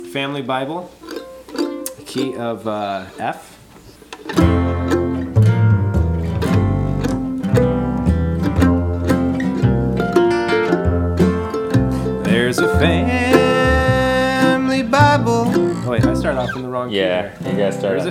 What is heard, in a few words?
Key F